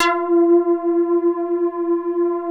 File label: LEADS; Masterbits; Vintage Voltage